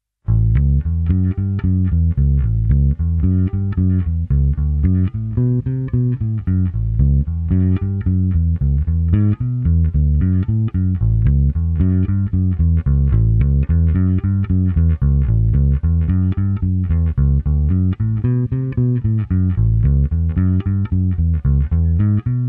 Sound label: Bass Walk